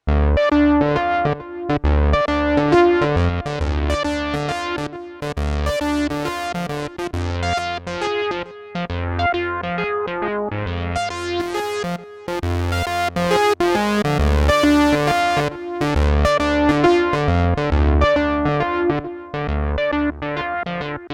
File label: 70's Rock Piano